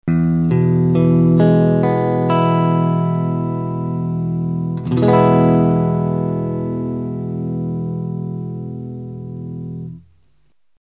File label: e major, guitar